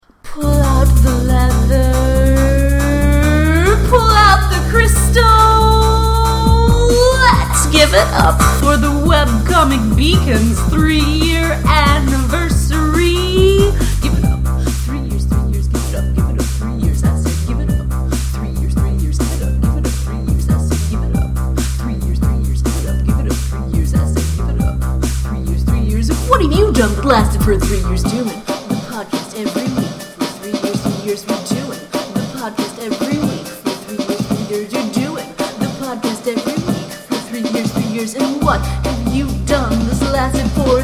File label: Outro